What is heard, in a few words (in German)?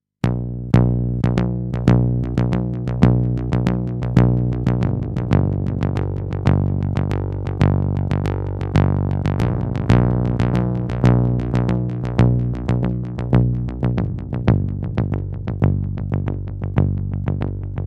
Electric Bass mit Repeat und Echo